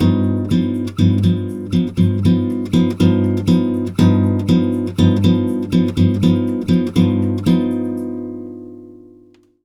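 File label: 120 guitar G minor 7, Brazil Bossa; USB Soundscan; AKAI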